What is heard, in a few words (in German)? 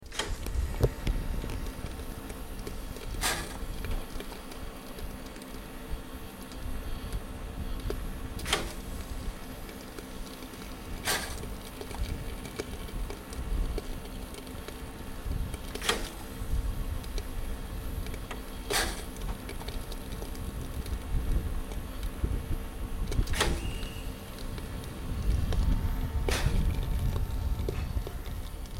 Dampf Lok Standgeraeusch